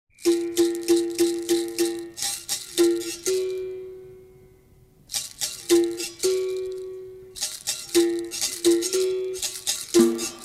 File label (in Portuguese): toque de capoeira angola